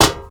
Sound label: Maint On Stone Run